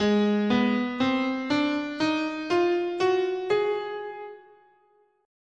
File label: Ab Hungarian Major, scale